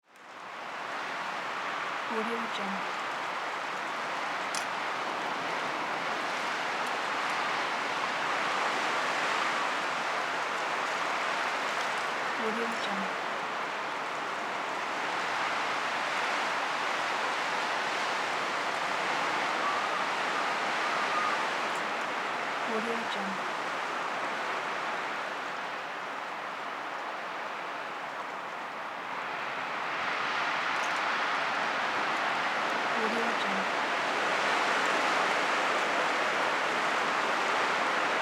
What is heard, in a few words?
gust of wind